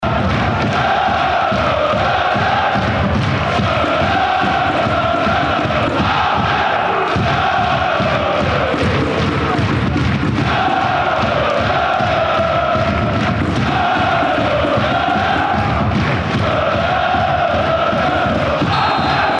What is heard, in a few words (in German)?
Schalalalala Aachen; liedgut